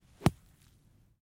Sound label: golf club sandshot